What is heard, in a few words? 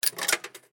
Coin In Machine; Granny 3; Android